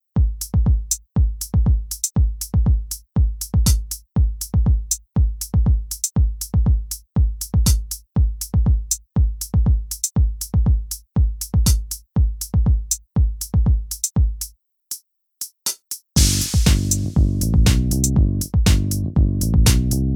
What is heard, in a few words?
off melody